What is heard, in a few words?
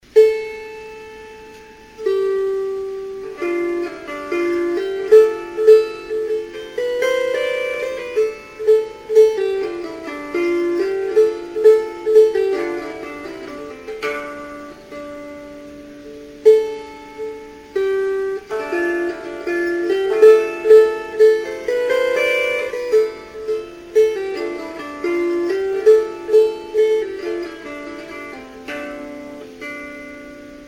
Lyre